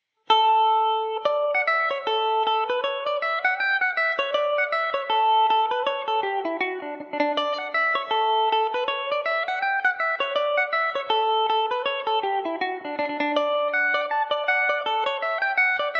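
ballinafad reel D major mandobird revisited, mandolin; The Ballinafad Reel; Epiphone Mandobird VIII electric mandolin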